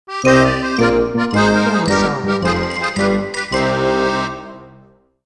Xylophone Accordion

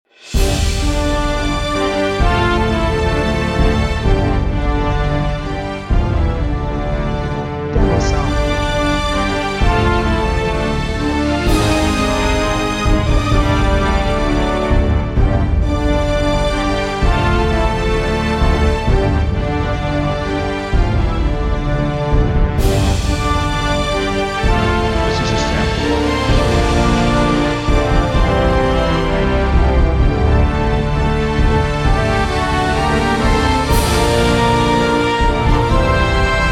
Epic Victorious and Successful Happy Ending

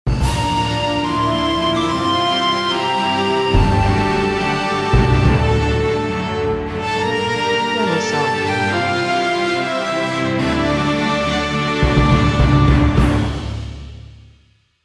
Epic Happy Majestic Ending

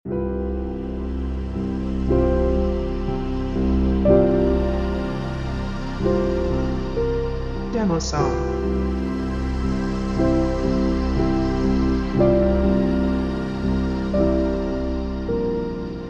Dawn of a New Day Flutes Short

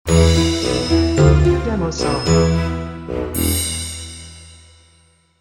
Bassoon Strings

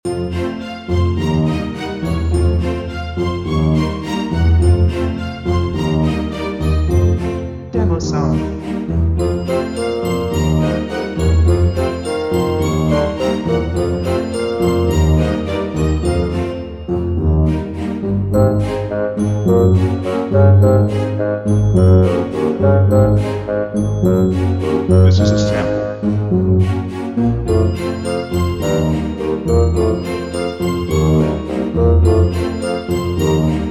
Loop Bassoon

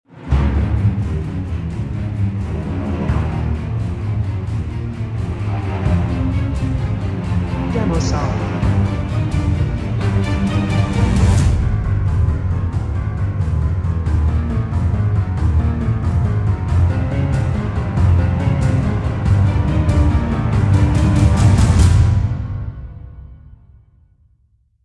Threatening Tension Builder

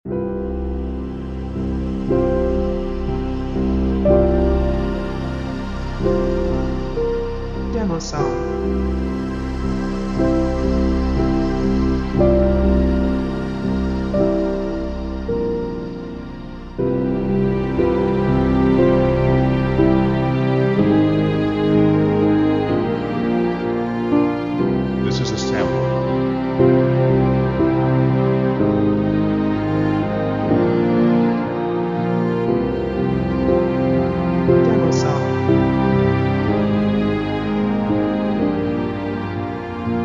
Strings Short

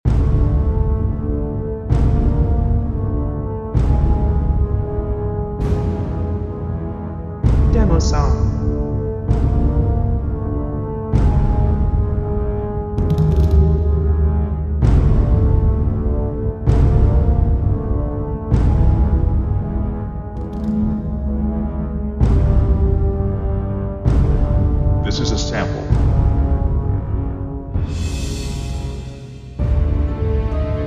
Mysterious Dungeon Walk Loop

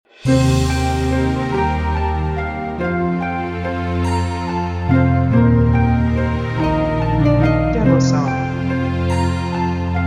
Dreamy Fairy Tale, Soft Intro

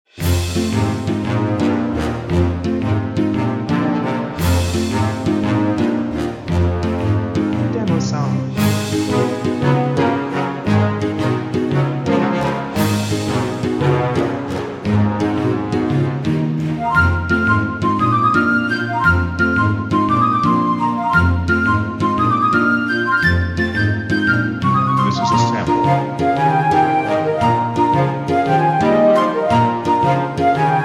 A Lively Playful Mood

